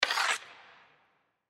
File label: Hockey Stick Slide Puck Shot; SPORTS; Blastwave